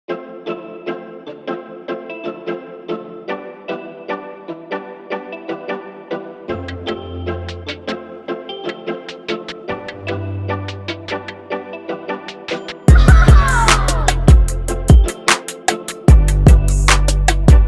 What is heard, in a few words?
Instrumental